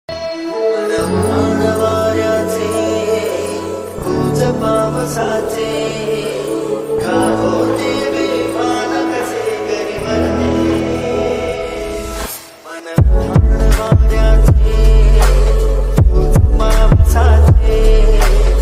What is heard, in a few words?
song